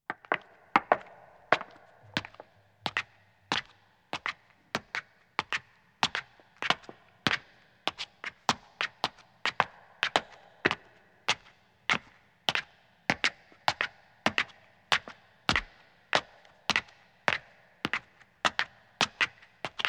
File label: Stone Stairs (Echo) Ascending; Footsteps; BBC Sound Effects Library